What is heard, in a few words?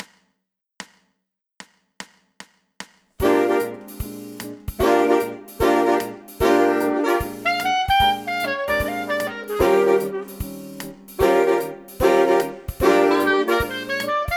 Blues Bb